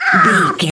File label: goblin fairy hurt pain